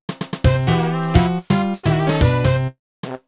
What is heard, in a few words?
Jingle Over; Superlite 2500 Tokyo Odaiba Casino; Nintendo DS